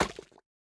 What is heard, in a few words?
Slime Impact Large